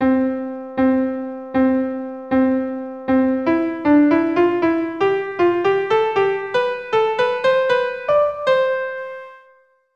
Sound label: Even Eighths vs Triplet Eighths